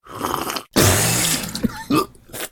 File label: spit; SCP 294